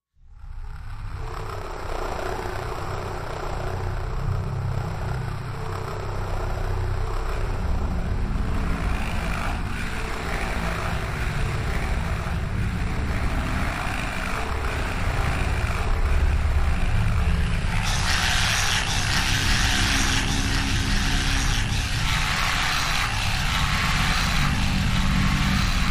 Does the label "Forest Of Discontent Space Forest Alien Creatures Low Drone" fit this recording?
Yes